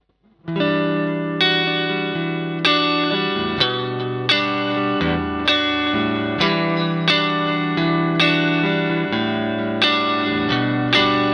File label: floyd rose